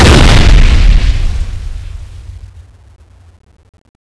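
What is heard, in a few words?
piano gun explosion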